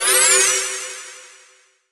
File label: Jingle New Drop Get; Puzzle & Dragons Gold